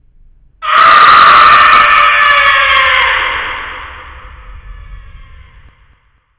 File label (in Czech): vykrik; cstrike; zm hewitt house